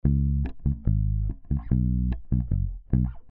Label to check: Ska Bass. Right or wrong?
Right